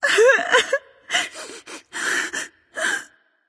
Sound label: boohoo light; smite; voicepack; hel